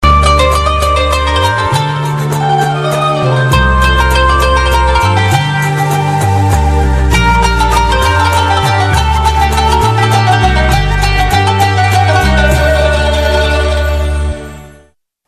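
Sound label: eastern SMS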